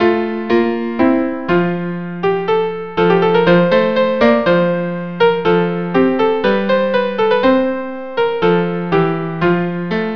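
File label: viola viola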